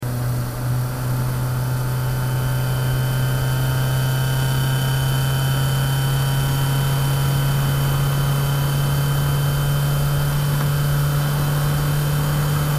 4-cylinder Sports Car; Accelerate To High Speed, Then Long Gradual Decelerate And Repeat Gradual Accelerate And Decelerate. Off At End.; 1986